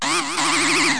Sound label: buzz Wiggle; Blender Magazine volume1.3 1994; moogly